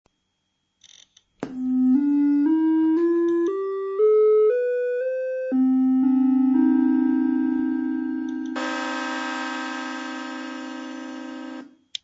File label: atmega Sid Test